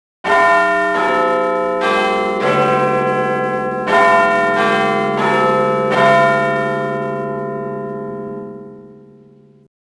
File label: Big Ben